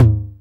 909 TOM, TR-909; Best Service Dance Mega House Dance

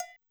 Cowbell Hi, 505; classic machines

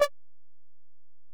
Bell, Alesis DM-PRO

